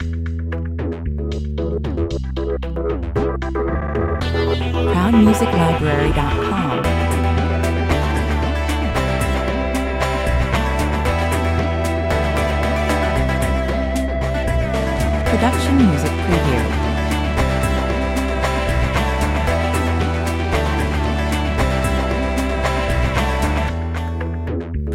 fire burn (no rhythm) 114 bpm

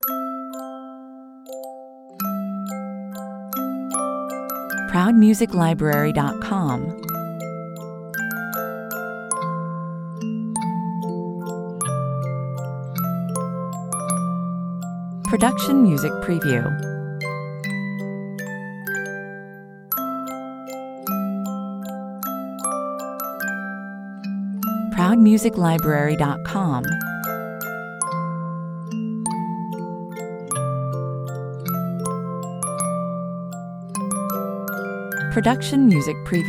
music box dancing couple 154 bpm; Musical fantasies, memories of the good old days

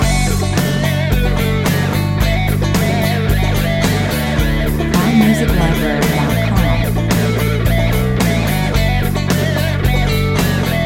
110 bpm

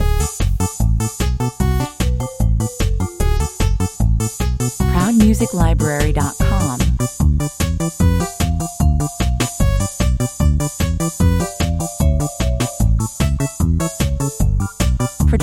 rock and roll, 150 bpm

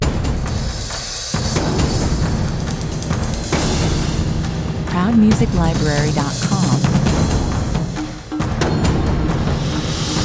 percussive attack, 136 bpm

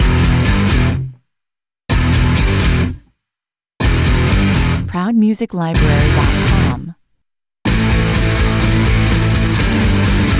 sport, 125 bpm

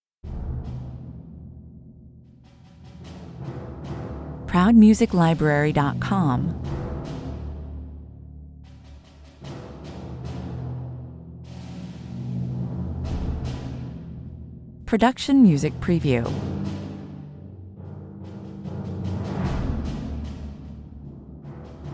percussion only

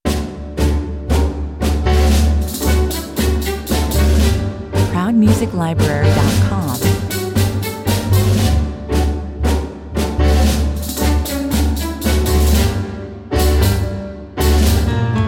simple tango soundtrack

